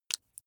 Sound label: flesh wet greasy squish single